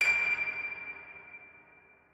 piano

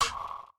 breakbeat

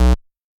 bass

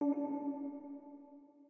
organ

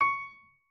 piano

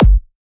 Dr Kick

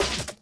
loop

